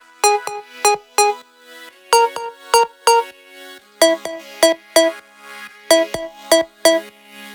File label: 127BPM Melody; Vengeance Samples; Warhog Kit